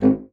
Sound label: G#1, bassoon; Xsample VOL-6; C BASSOON